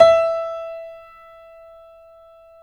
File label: CONCERT PF, E4F; AKAI S-Series CD-ROM Sound Library VOL-7